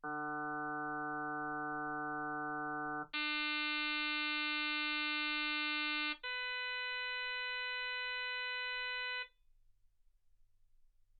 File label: harm sinus 48kHz